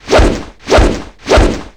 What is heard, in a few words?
swing